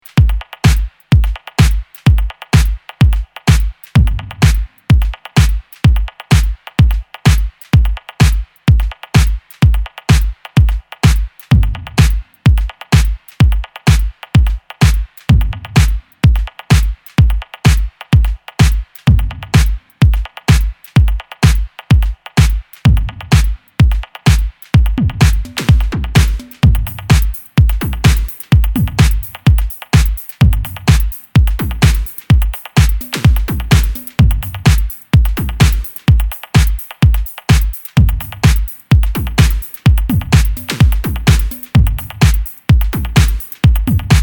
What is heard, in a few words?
minimal soundscapes, deep minimal